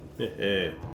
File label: hehe